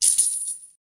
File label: chain, magic and spell sound effects